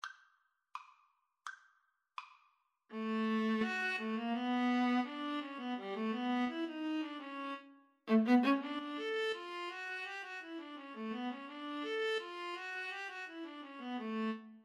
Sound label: Violin Viola Duet